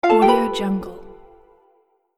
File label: harp